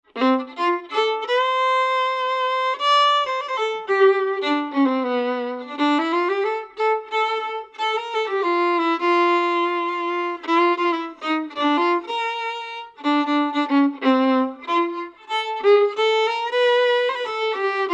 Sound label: Jazz Mic